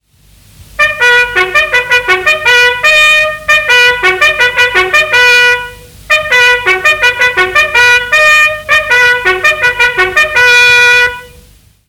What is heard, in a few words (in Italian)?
inni marce militari segnali di caserma richiamo picchetto armato d'onore